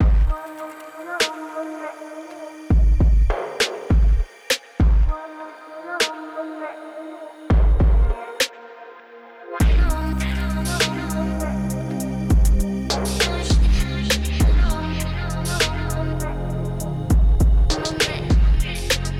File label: Prominent Kick